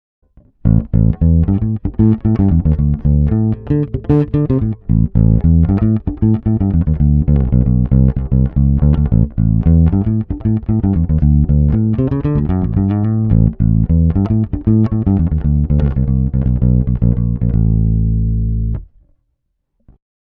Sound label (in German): Europa 1 e bass slow; Dave Hill Designs